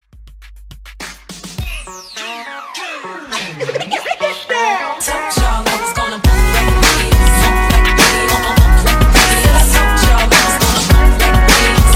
Country Re Drum Hype Dirty 103 BPM

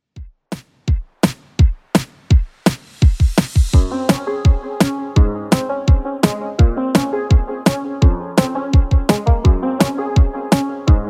Top40 DJ Friendly 84bpm

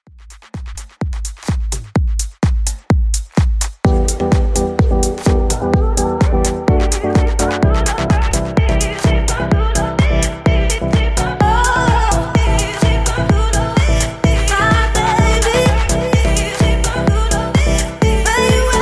Club 127Bpm; Dj In Outro

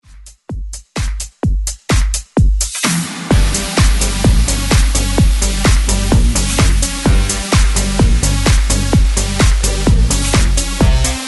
Clubmix

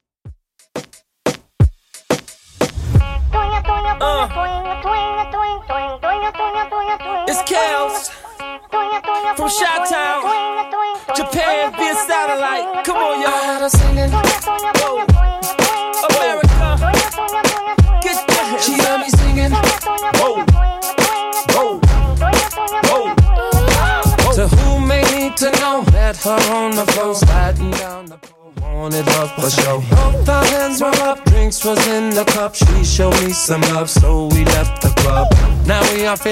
Throwback Rnb Redrum 89bpm

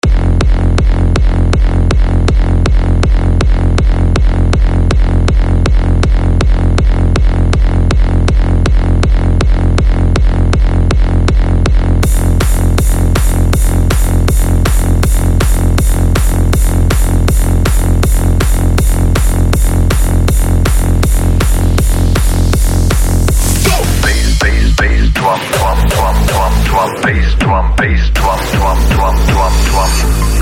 Bassdrum

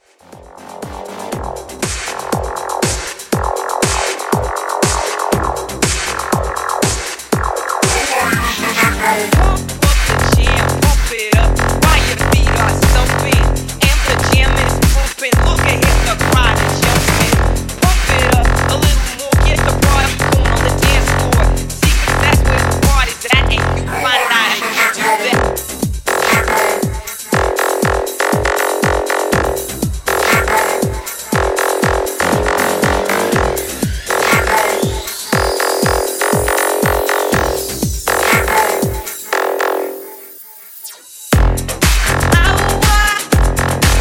Techno 120Bpm; Mashup

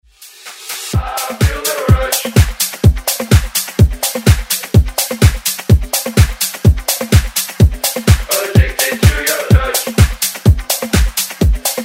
Dance Redrum

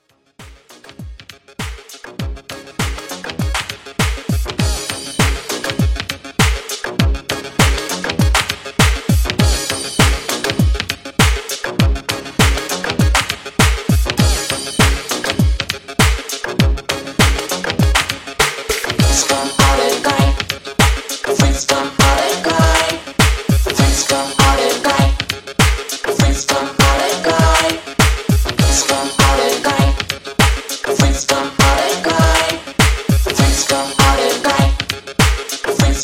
HipHop 100Bpm; 80s; Redrum

